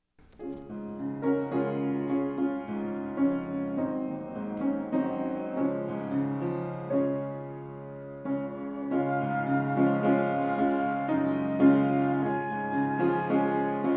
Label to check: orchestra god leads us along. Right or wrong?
Right